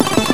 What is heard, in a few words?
Helicopter, SNES; Revolution X; NON Helicopter Pursuit